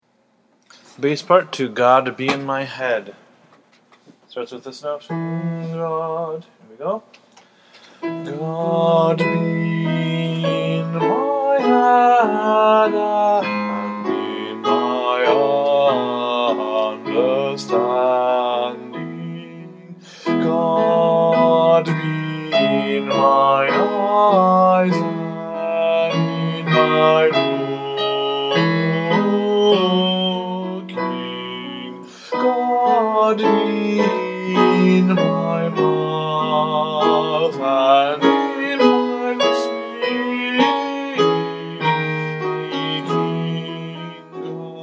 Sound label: god be in my head bass